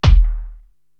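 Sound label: bass drum Cringer, Korg TR-Rack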